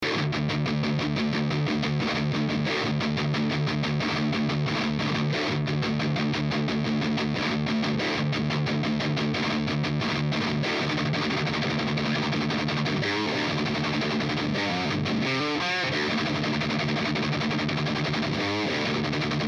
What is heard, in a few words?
Seymour Duncan Black Winter JCM800